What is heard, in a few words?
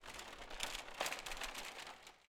Cart Moving